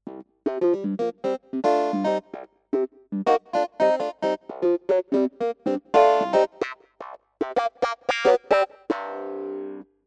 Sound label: Touch Wah FM Comp